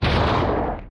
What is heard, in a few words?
thruster engine deactivate; platform thruster; Factorio